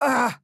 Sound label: AHurt